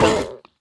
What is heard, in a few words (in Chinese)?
海龟受伤声